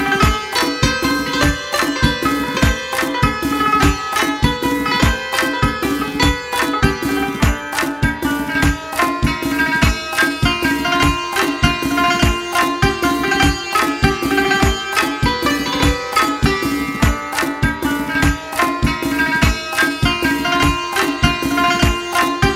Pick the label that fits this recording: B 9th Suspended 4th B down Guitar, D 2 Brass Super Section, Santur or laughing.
Santur